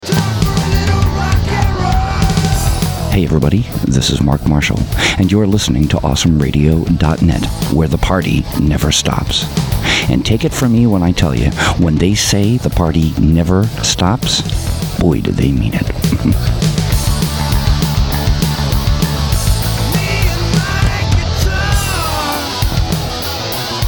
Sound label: radio id music